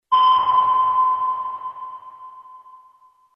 sonar; battleship

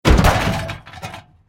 Fail

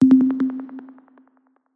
suspense

